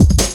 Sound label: Ezee Rolla Cut, Drum Loops; Zero-G Jungle Warfare 1, 2 & 3